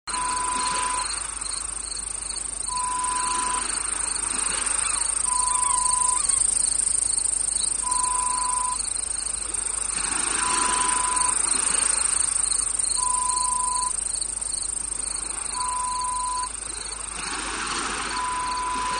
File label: Beach Sound effect